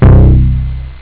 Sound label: juno, synth bass